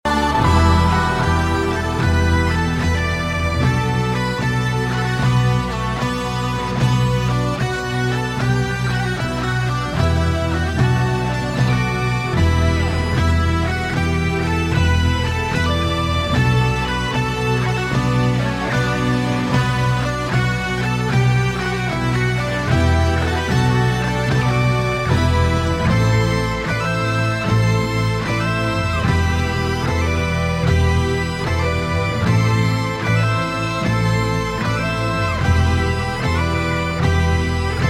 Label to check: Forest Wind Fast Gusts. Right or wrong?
Wrong